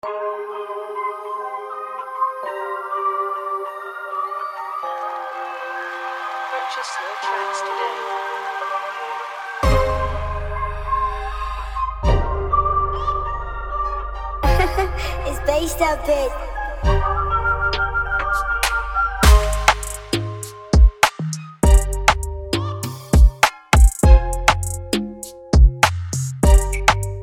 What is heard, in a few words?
Connect 100bpm Afropop; bazestop